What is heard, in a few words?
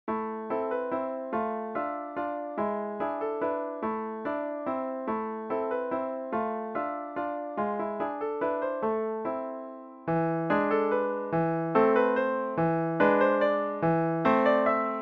piano